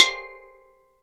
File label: steel drum